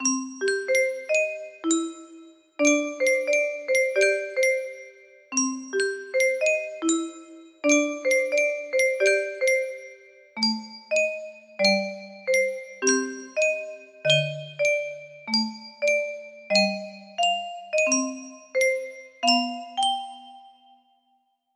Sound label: chorus, music box melody